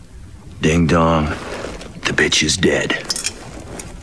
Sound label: ding dong